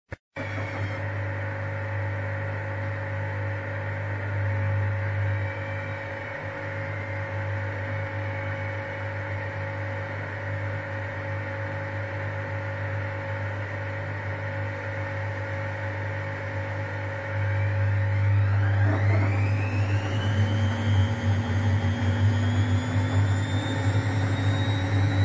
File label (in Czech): zvuk, oprava pracky siemens; oprava pracky siemens t13 55